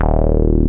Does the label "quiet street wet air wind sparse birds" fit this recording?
No